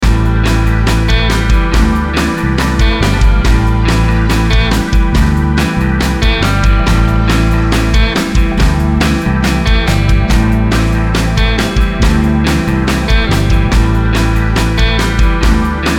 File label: BEAT ALTERNATI, Beat Loop Alternative Rock Light Relaxed